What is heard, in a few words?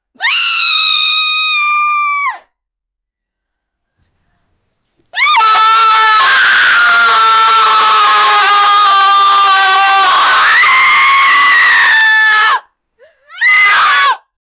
man screams loud while br, pain